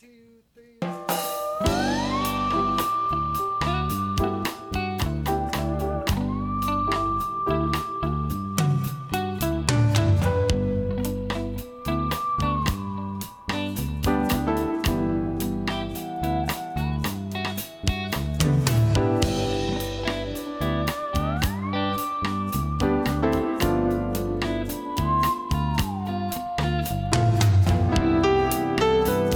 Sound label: Bongo; Bongo #2 (Unused)